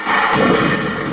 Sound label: shoot